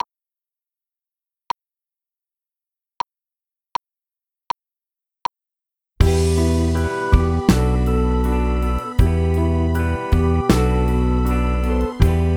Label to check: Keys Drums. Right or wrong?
Right